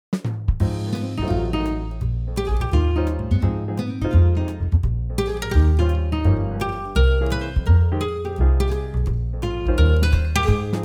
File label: Happy Swing Band; 밝고 맑은